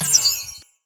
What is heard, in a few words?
frostshot; LoL; ashe skin06